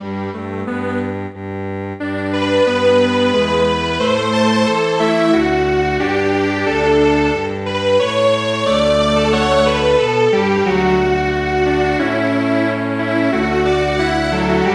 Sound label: Strings Quartet, midi traditional; You Our Ancient Land